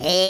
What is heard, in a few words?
jump, Scotsman; speech